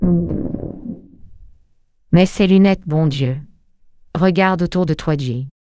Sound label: jingle